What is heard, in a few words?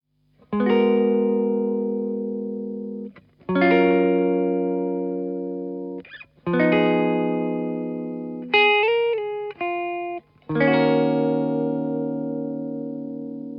Clean